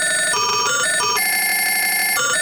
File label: telephone